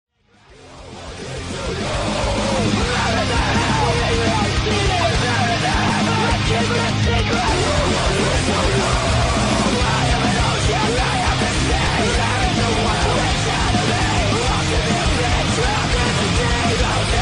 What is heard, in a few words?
pop ringtone